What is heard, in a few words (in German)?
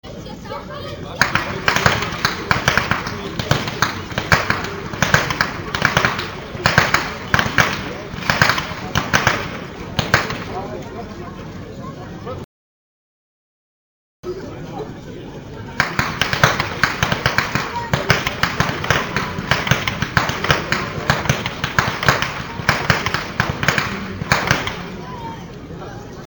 schnalzen, jugend; kothbruenning